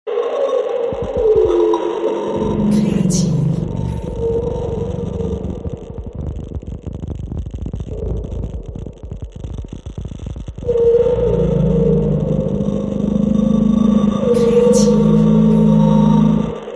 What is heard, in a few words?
Galaxy Radiowave effets